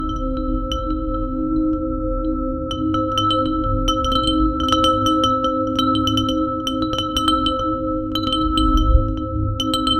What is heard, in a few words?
ships bell soft and eerie; nautical